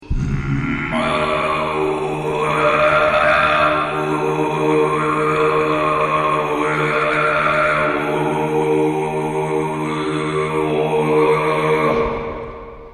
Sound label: borbang, khoomei